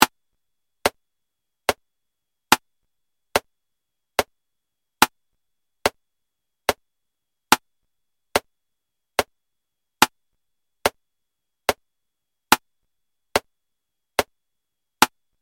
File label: 72bpm 3 4time 20beats